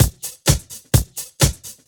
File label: Housebeats, 128BPM; Vengeance Samples; VEH2 Loops